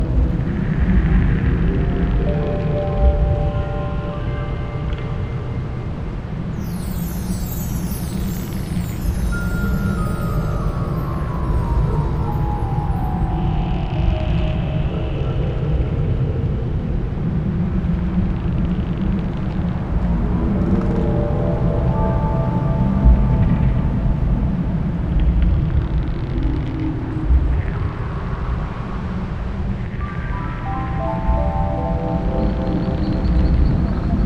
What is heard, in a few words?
Cave Ambience; Angry Birds Trilogy (3DS, PS Vita, PS3, Wii, Wii U, Xbox 360) (gamerip) (2012)